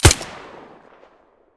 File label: silencer fiveseven stereo, weapons